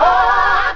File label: AAWWWAAA